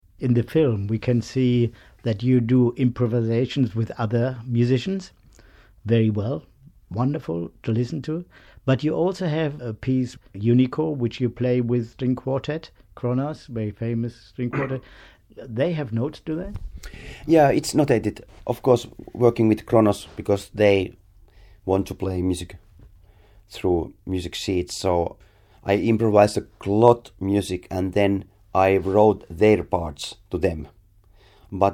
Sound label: Improvisation